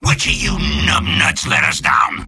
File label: jeers; Soldier